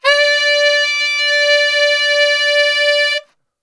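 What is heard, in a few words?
D 2X SAX STR, saxophone; Best Service; Brass Super Section; SAX STRGHT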